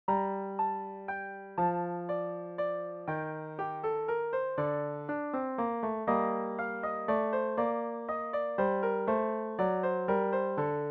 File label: minuet in g minor piano solo